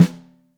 SN Top, Snare

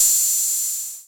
Hihat Open 606; Drum Machines; Drum Hits

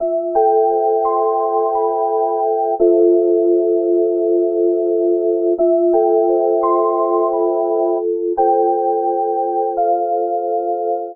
Organ A Minor 86 bpm; MIDI Clips; Tonal; Accompaniment; Drive and Glow; Ableton; Factory Packs

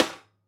SNARE EFX 4

